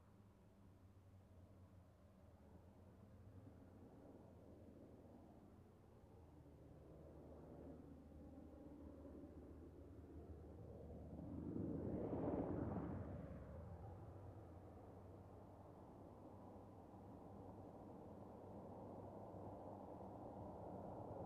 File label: Car Driving by on asphalt road